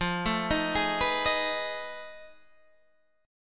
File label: F 7th Sharp 9th Flat 5th, Guitar, DADGAD